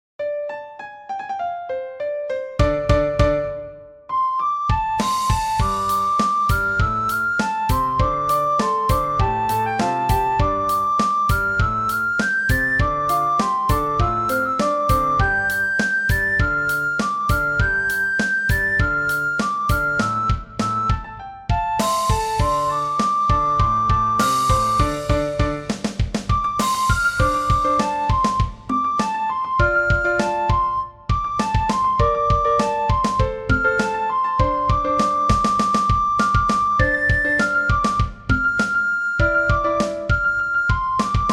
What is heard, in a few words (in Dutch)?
rustig sopraan; Flierefluiter 6